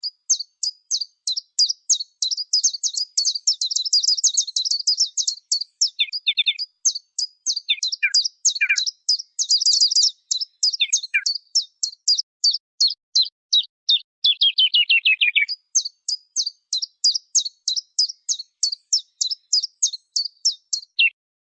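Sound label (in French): pouillot véloce, oiseaux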